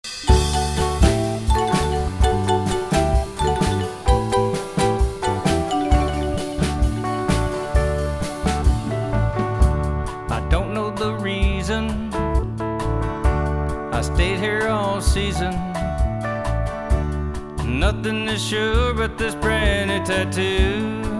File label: Classic Rock Band; Eureka Shores Band